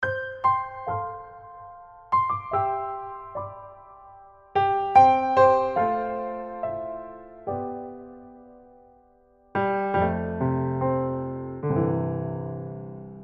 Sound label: Introspective Piano Etude